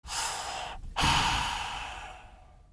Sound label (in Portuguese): baforada